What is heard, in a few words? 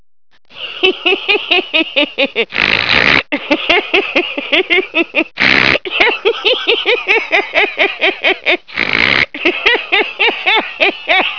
A LOOOOOONG LAUGH!